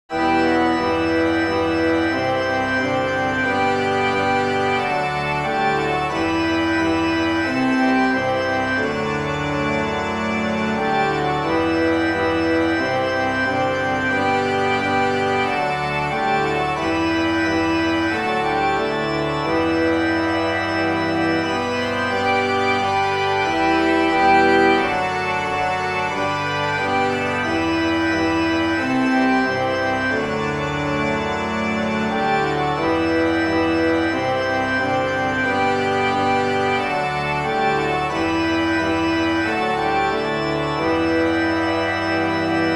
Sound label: Organ